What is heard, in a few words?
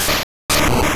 Seismic Strength Pickup; sfx; attack moves; pokemon; gen 2; gsc